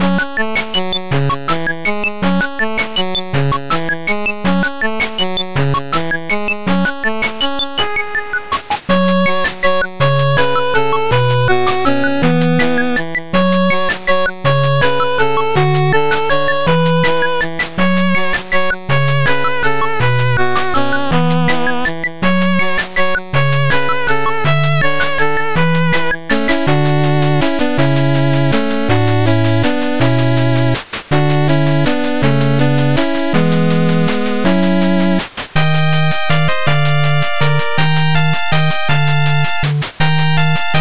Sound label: snow stage bpm162, 8bit music for action game. This music is loopable